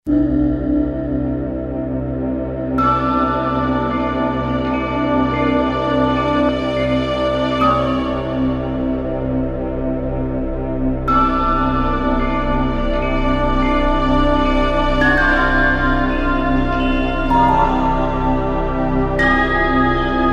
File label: Voices, Yamaha S5502 Drums